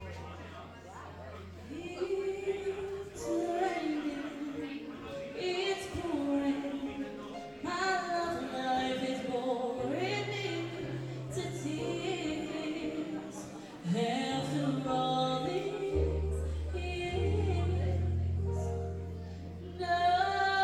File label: Vox Vibes Live